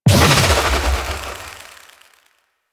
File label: Fairy Light Impact; DE; Warframe